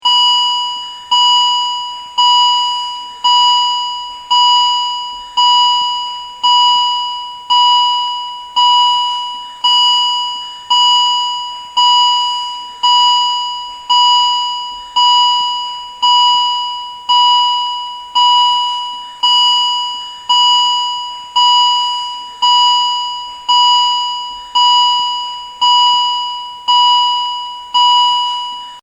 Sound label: High; alarms